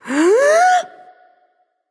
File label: breath; smite; aphrodite